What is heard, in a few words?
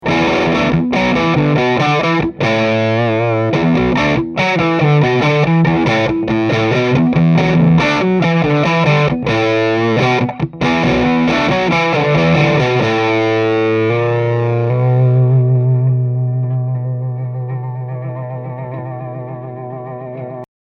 Blender humbucker bridge riff